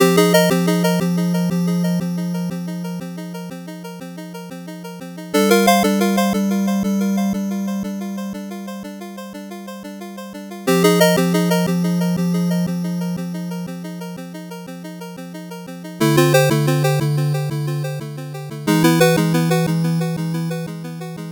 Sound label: 8bit bgm normal